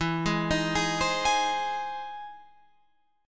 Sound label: E Augmented Major 7th E down Guitar Open F; arpeggiated; E+M7; JGuitar